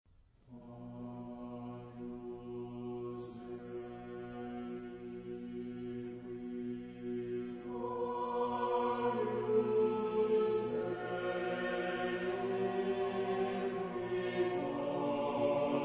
Mass for Double, chorus; Martin; Agnus Dei

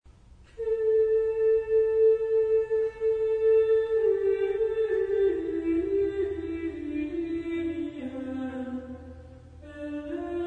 Mass for Double Choir Kyrie; Martin

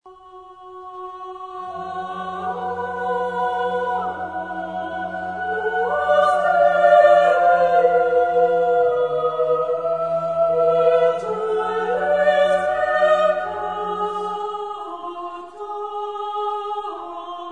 Mass per coro misto a Cappella Agnus Dei; Hindemith

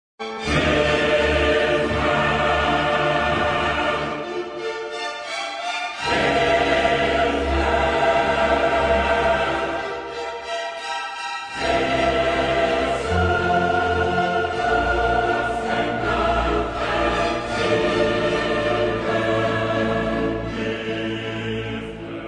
Oratorio, chorus; Mendelssohn; Elijah Op70; Hilf Herr

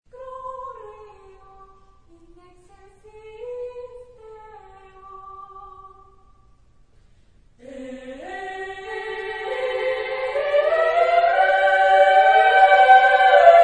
Missa simplex Gloria, chorus; Andriessen

